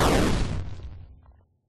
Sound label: death; Minecraft